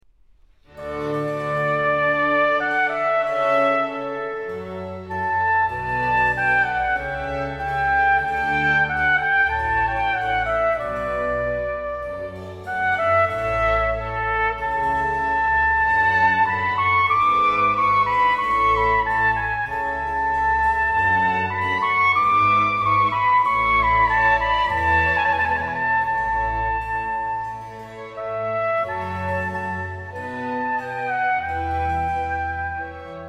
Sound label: Concerto in D Major for Trumpet, Strings and B.C. I. Adagio; TWV 51 D7